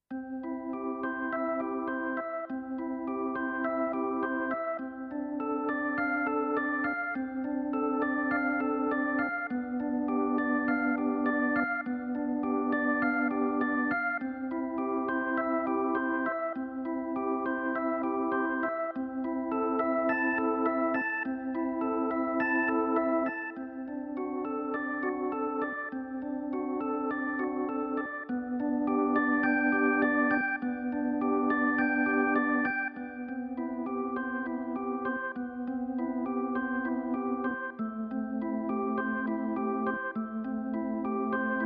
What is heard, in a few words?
Mellow Organ